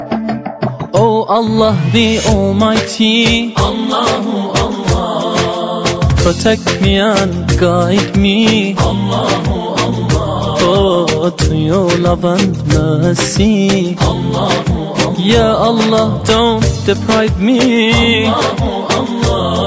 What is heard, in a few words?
Ramazan Ringtone